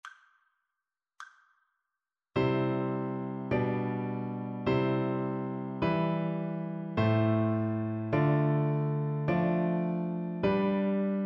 harmonic dictation; ear training

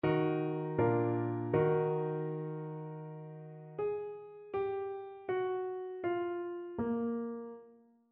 melodic fragments

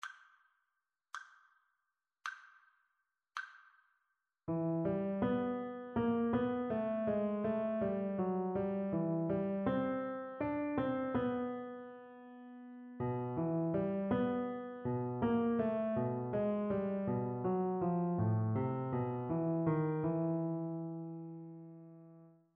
melodic dictation; ear training

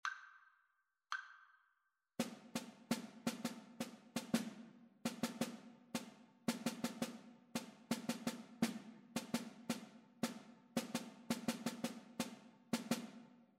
rhythmic dictation

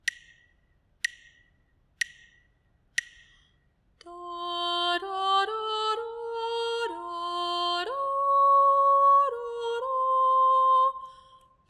melodic dictation

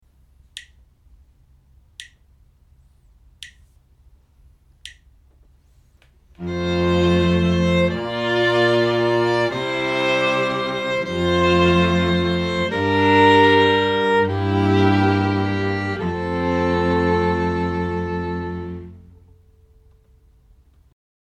harmonic error detection

